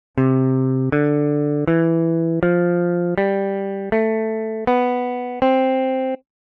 gamme de do guitare

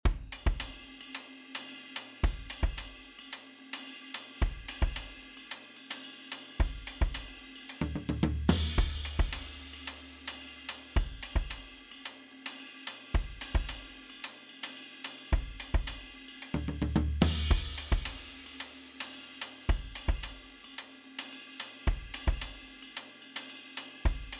Dance basque drum et piano

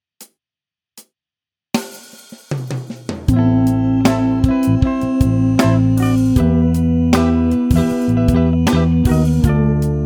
voix 1

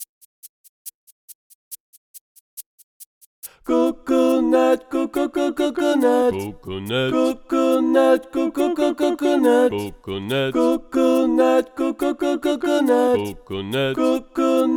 sans tenor

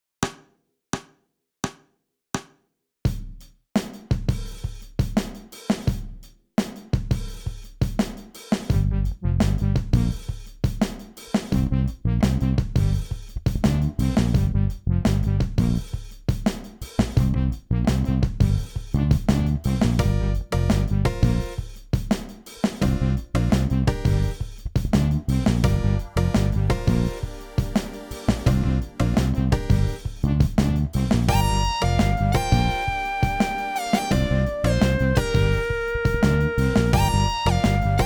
mineur penta

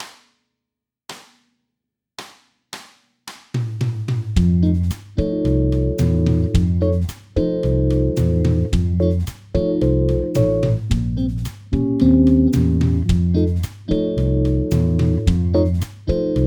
playback Solo

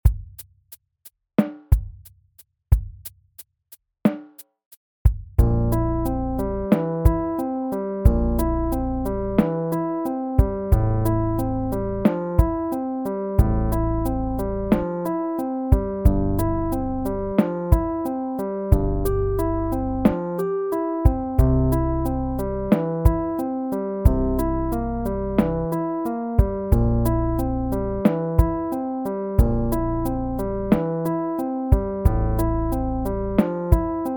playback lent

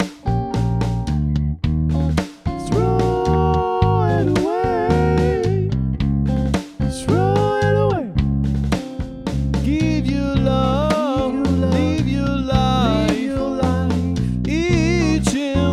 playback double voix

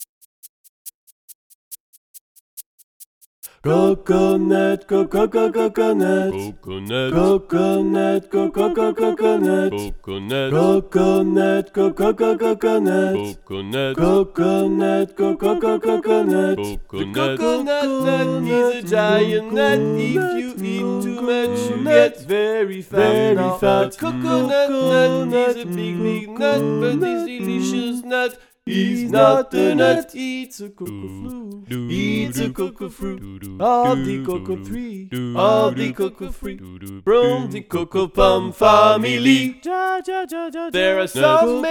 sans alto

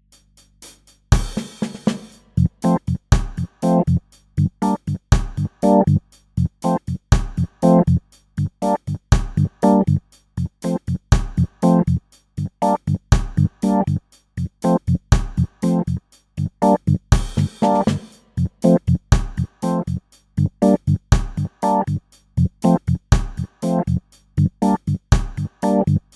boucle FA Gmin

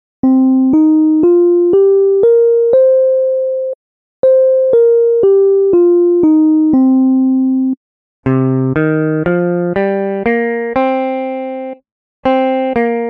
penta min do

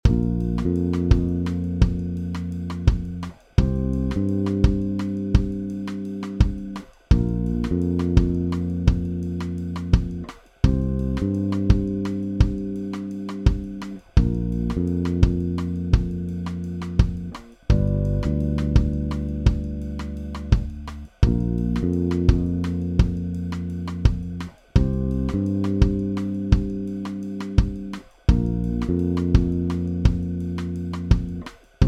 boucles

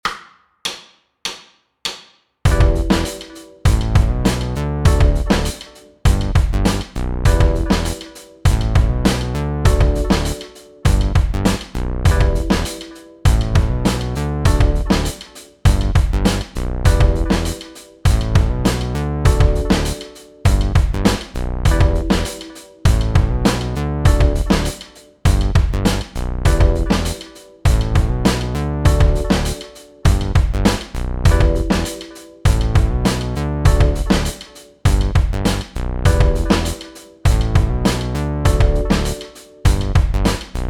playback en Mi

